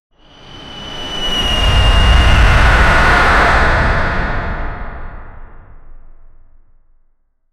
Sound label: an ominous projectile, projectile